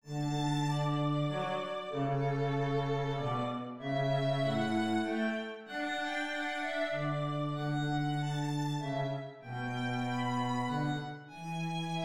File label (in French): menuet majeur; purcell henry